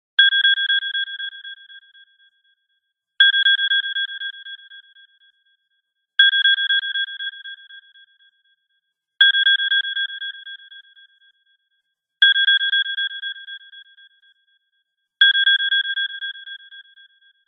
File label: iPhone Sonar